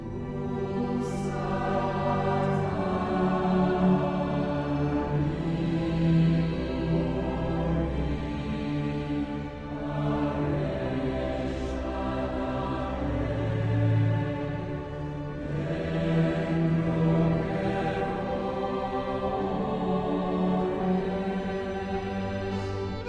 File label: Gloria Solo tutti; Irish Mass